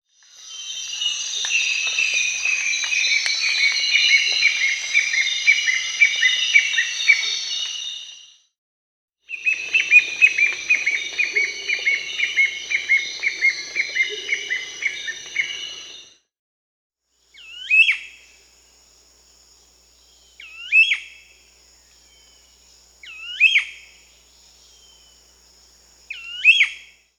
Strong-billed Woodcreeper (Xiphocolaptes promeropirhynchus), Peru; S. America